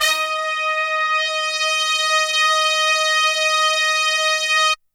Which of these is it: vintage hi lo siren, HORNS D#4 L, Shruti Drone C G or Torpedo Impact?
HORNS D#4 L